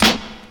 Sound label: crispy snare drum sound a key